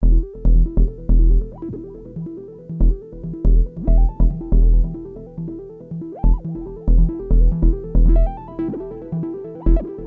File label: yamaha dsr-2000 sounds, trance 5x external fx